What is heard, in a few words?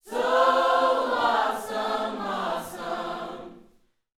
Vocal, Gospel, PHRASE; Spectrasonics